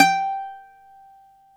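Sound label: BZ F G, bouzouki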